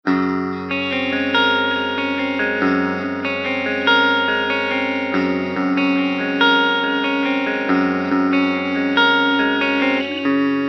destroyer, mobius

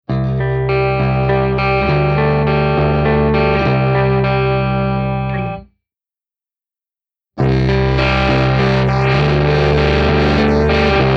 destroyer; Mobius

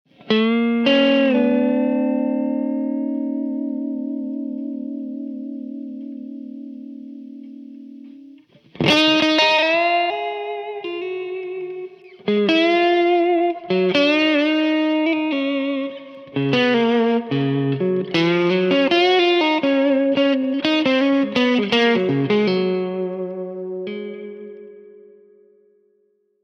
Clean Guitar Solo; Multi fixed, eighth note sync, higher bias, medium Spring

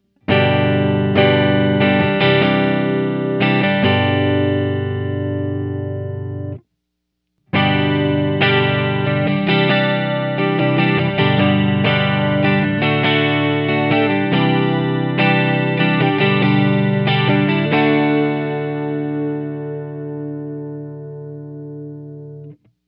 mobius chorus pure and simple

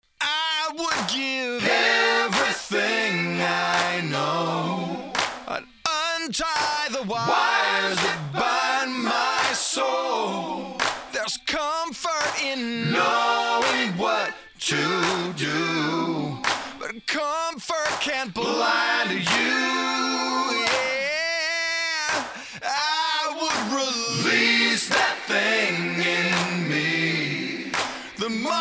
Male Vocal and Choir; Lead Vocal: Room machine set to Studio with high predelay. Choir: Room machine set to Studio with low predelay and decay

